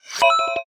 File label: Bonus